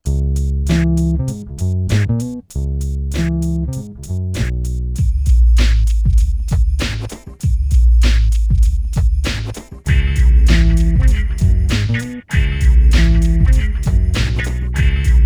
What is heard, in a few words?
98BPM C; InVision Interactive; 40 Oz Phat Slammin